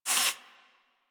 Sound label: Leg Movement; Enemies; Warframe